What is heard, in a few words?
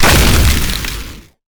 weapon staff frost orb explosion